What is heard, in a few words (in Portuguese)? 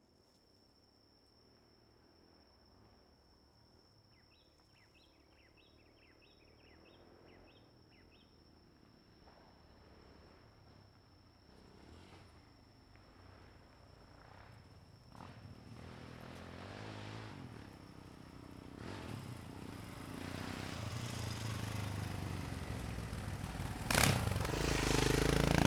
Motos de MotoCross Passando em Rua Asfaltada bem Rapido; maquinas; colecao sonora do cerrado